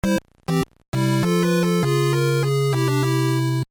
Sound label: music game over